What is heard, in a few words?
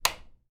Light Switch